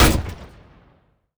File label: Hit In, Damage; DCS